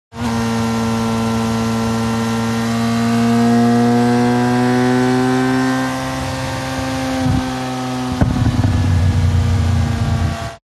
TRANSPORTATION MOTORCYCLE Sport Bike Slow Acceleration Short Deceleration